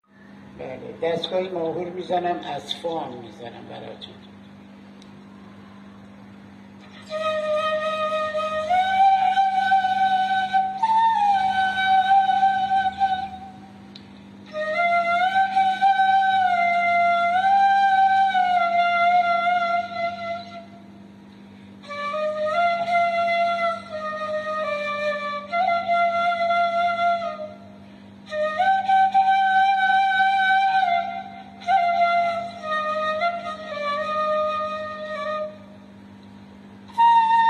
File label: flute